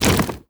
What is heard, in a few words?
single, antlion wings; creatures